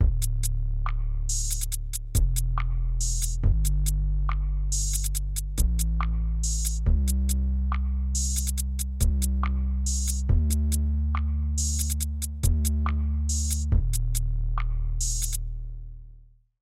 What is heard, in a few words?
Bass Tune Wheel